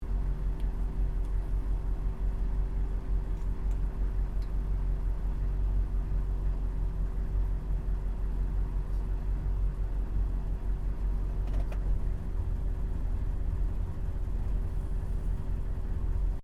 4WD HUMVEE IDLE INTERIOR, US Airforce model Humvee; Trucks; Vehicles